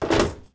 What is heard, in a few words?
nuke place; weapons; fatman